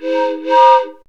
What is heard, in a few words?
TRAIN WHISTLE; Zero-G Ambient 1; WHISTLE FX